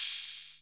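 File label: 808 open hi-hat, drum machines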